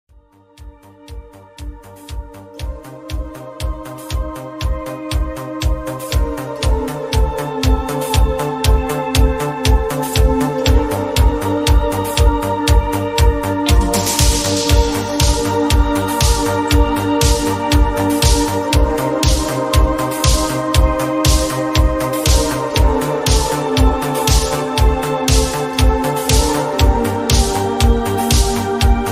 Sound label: eurodisco atmospheric melody